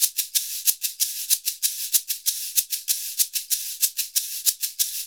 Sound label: SHK, Shaker; Univers Sons; Basicussions